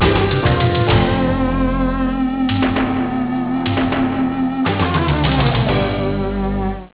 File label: rock